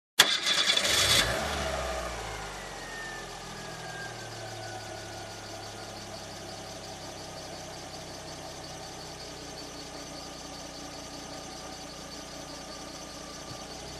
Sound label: Car engine ignition and driving away